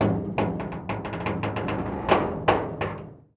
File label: stress metal; World of Tanks Blitz; ambient canal